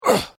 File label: Pain Umph, Male Painful Grunt, Hurt